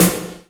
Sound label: WET 909 SN, TR909; GM KIT